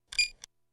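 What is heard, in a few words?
sounds of a smoke detector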